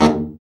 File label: BRA BARI, Bariton Sax